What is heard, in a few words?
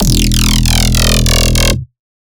Bass Shot E Scraper, Dubstep; Ghosthack; Advent Calendar 2018